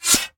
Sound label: blaster; Crash the Bash - Drink Break; alvinnn